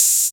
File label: Open Hihat